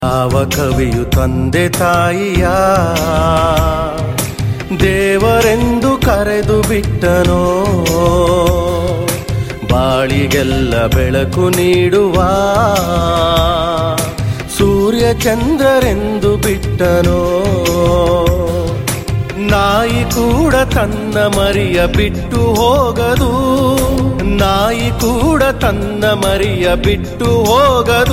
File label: male version; ringtone